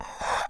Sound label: shoick